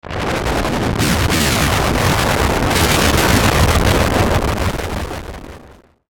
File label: Descend Multiple Hard Crackle Distortion Fuzz; Descending Sound Effects; Blastwave